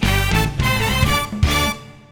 Choice Made Music; Wheel of Fortune

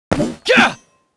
Knight M Attack B

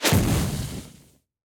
Sphere explosion; Likho

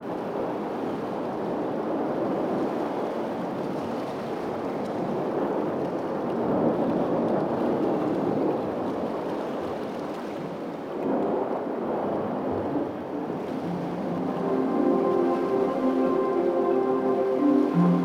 Beach Caves; legends of dragaea idle dungeons the soundtrack 2025

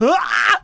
Enemy Generic Human Death Eaten; Rampage

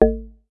ui generic error

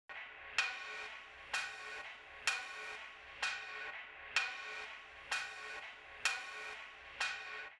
hammer Atmo; 16beats

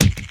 Universal Block Sfx; Superbrawl; Nickelodeon Super Brawl Universe; Android

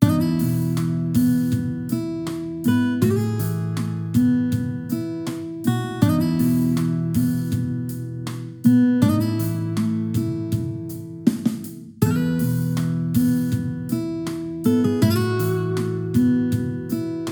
Casual; Touhou Fanworks Senran Meisuishu Tactics

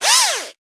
Drone; Rabbids Multiverse; iOS, macOS, Mobile

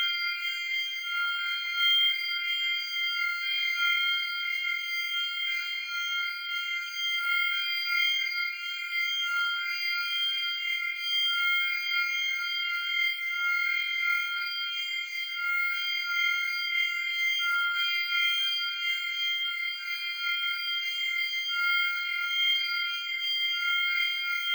New playful

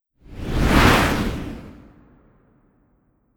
Skill Fire

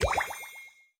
Obstacle Snowball Launch; In Obstacle Snowball Launch

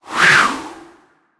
Hawk Speed Fly

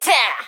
Pecheneg Archer Attack

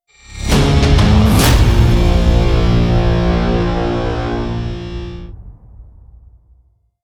Ui Game Over

